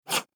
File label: swipe